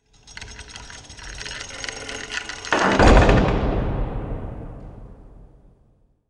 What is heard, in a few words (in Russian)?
Temniccu zakryli